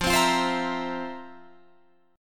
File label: F Major 7th Suspended 4th, Guitar, Open F